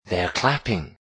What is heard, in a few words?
theyre clapping